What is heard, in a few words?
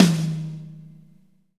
XV Tom Hi, Roland XV-5080